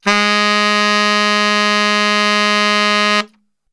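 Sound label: G# 2X SAX STR, saxophone; Best Service; Brass Super Section; SAX STRGHT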